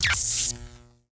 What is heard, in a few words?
damage; ACCRETIA